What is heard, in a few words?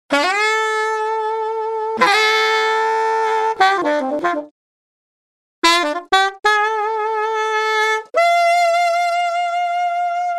Sax; AtomicReverb